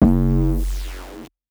Zapper, techno drum fx; Single Hits

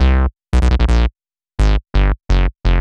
drumnbass 170bpm Bass C; musicradar; Basslines A

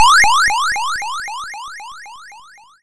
YM High Jump; SFX; ChipShop Deluxe; ChipShop Hits

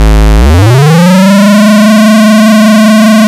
YM Riser; SFX; CHIPSHOP DELUXE; CHIPSHOP HITS

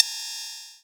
Vermona Hat

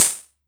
Jomox Hat; techno drum fx; Analogue DrumHits

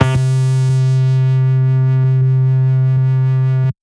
Tech Bass C2, techno; musicradar; Bass Multi

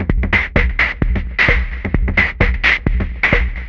Beat (130BPM), techno; techno drum fx

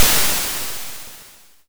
YM Noise; NOISES; ChipShop Deluxe; ChipShop Hits

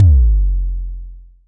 Vermona Kick

